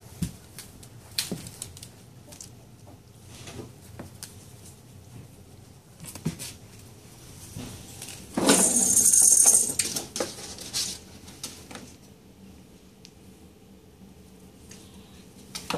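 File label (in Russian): pereklichka dvuh dukayushchih, hork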